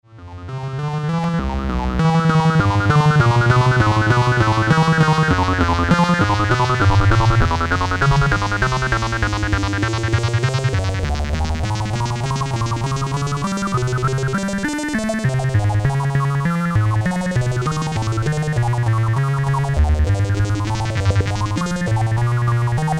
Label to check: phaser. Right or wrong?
Right